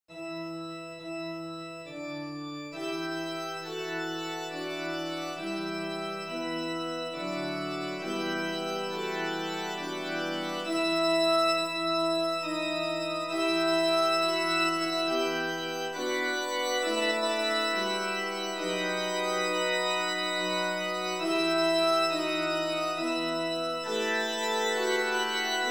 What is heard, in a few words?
lullay lullay two octaves christmas handbells